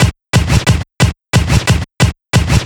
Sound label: Big Scratch